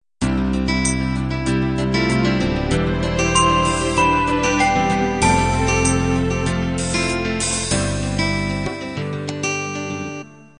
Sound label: guitar ballad intro